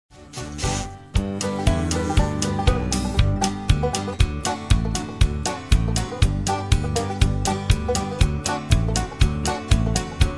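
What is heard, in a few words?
karaoke instrumental shoo fly dont bother me; childrens favorite songs; disney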